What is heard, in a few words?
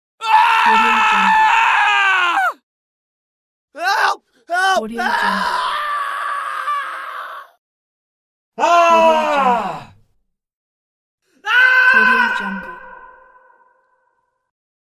man screaming